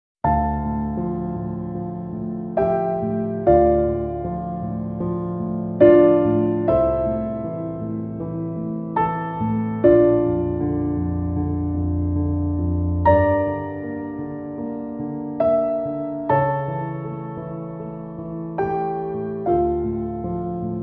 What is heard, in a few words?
Piano Only